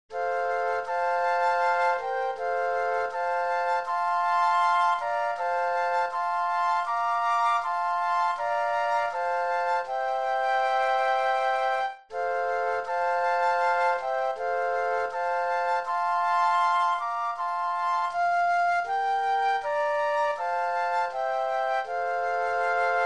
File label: Flute Trio